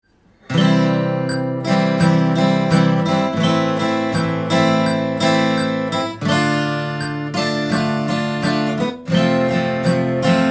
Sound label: low chord, guitar